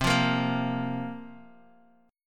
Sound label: C 7th Suspended 4th, Guitar, Open D